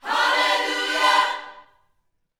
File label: Vocal Gospel PHRASE